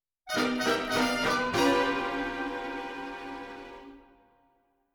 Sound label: INCIDENTAL ORCHESTRA